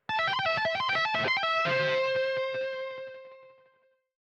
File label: Tapping